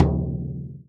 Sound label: BASS, INDIAN PERC; Big Fish Audio - Didgeridoo and Other Primitive Instruments